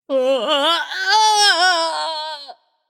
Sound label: nv fear; smite; voicepack; morgan le fay